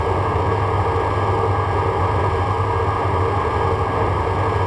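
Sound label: TRUCK IDLE; 1997 MMX LAN PACK (win 95)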